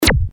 syntetic zap

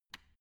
switch turn off soft

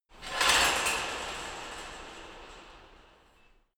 metal fence big rattle

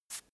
brush single short

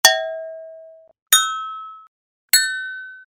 bell

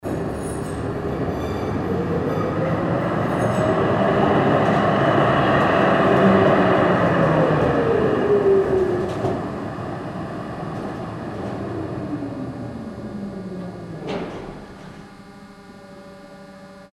tram arrival

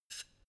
pneumatic pump light short

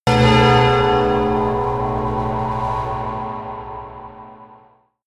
melodic church bell pad low

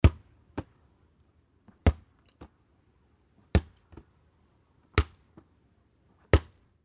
child playing with ball

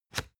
spring binder lock

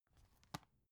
dvd case close soft

